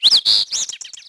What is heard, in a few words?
sparrow; ambient birds; World of Tanks Blitz